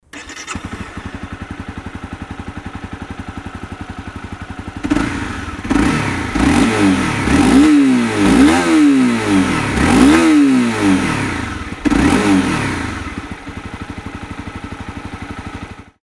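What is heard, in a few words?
cbr250r, motorcycle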